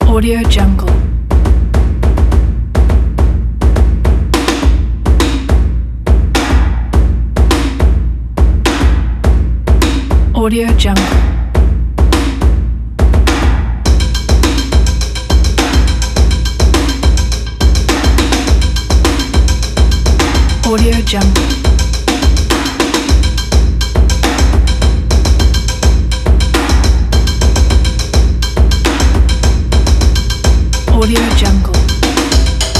stomp beat

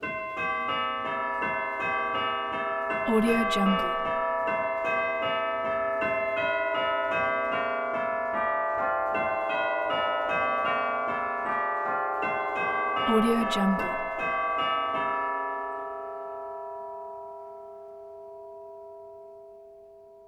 church bells; prosoundfx

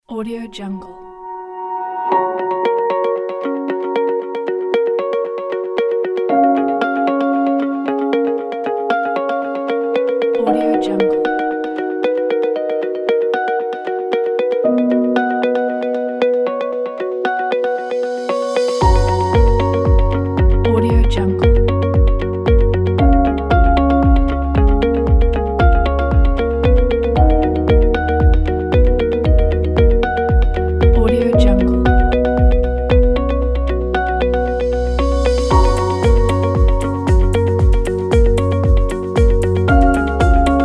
inspiring ambient music; inspiring ambient music pack

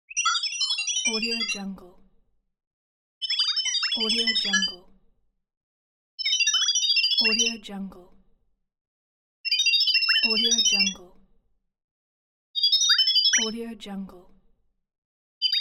droid robot beeps; applehillstudios

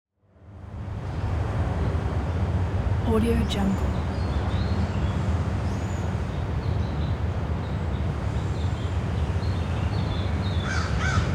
new york city west 19th street 10th avenue

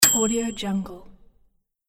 microwave ding

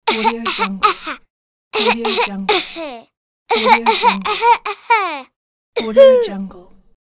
little boy cough

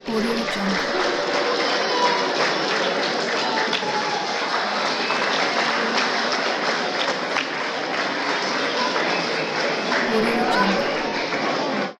crowd clapping school auditorium

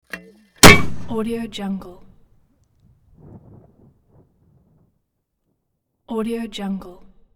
mortar launch and impact

